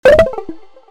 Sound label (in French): chargement barre prix hebdomadaire; monopolygo